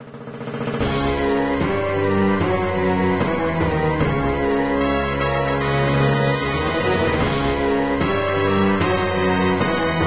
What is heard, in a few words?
Negative; MapleShrine